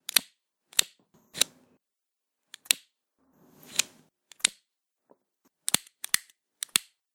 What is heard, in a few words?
Cigarette Or Cigar Lighter Sounds; Smoking